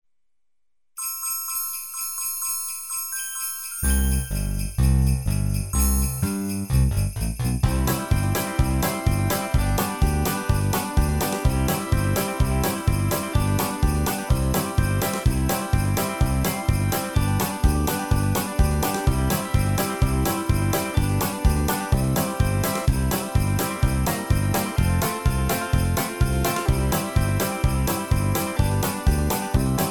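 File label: rhythm track with bells and banjo backing track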